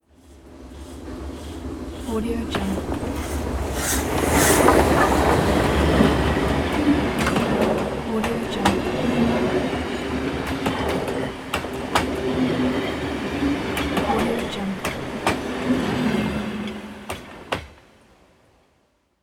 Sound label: steam locomotive train pass by slow